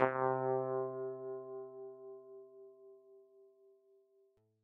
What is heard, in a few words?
medium distance string